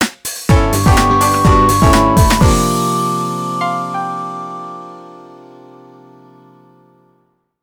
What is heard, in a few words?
Jingle; Gran Turismo 4